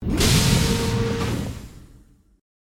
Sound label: intdoors open, tardis; p00gie